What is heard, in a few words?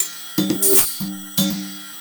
Synth Perc, Percussion Loops